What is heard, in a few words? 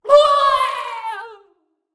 Swordcaster Old Death Vocals